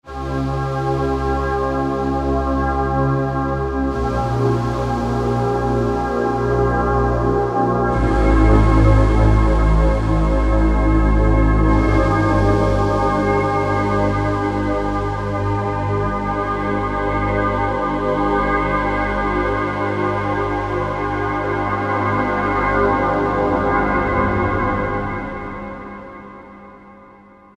X-hale, Techno Collection; Roland SR-JV80-11 Techno Collection

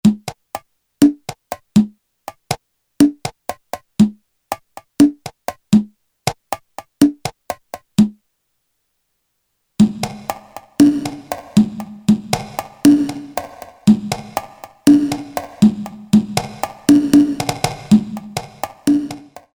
Club, electronics M300; t.c. electronics M300

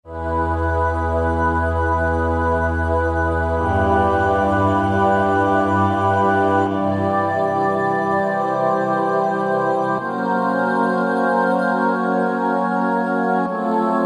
Glass Choir, Roland V-Synth; Factory Patches

